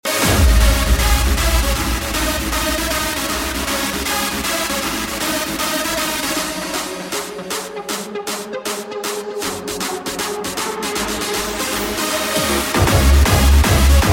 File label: reverse ringtone